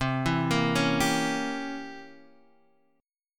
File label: C 7th Flat 9th C down Guitar Open D